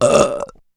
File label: self attack; weapons; cinderbrew; dota; brewmaster